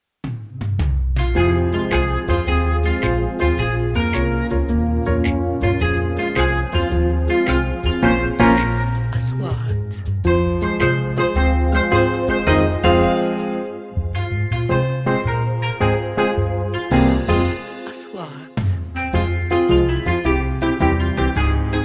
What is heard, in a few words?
advertisements